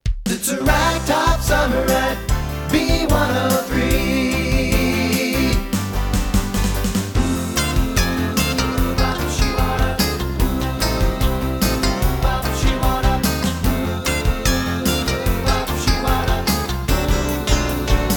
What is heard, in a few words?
Radio Station Jingles